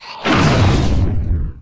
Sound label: Dark Elec Spike